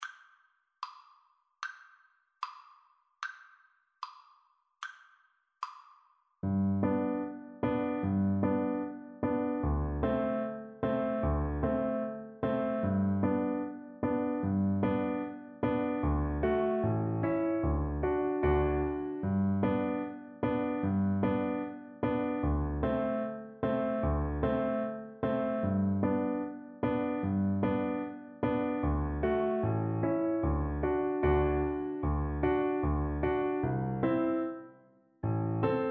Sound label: trumpet hornpipe violin